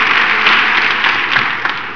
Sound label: clap end; PEOPLE